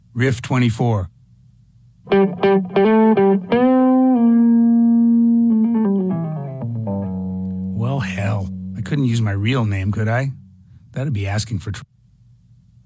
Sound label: Riff